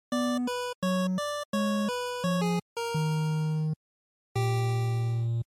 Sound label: Action, Game Over; NES; bgm